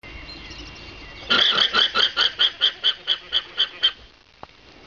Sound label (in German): wiehern, lamas